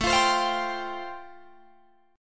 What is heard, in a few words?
A-Minor 7th Sharp 5th, Guitar Drop D, chord